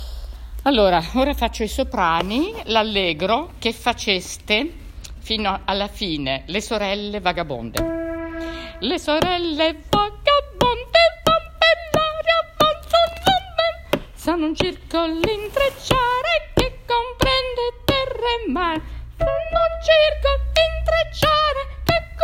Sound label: che faceste allégro soprani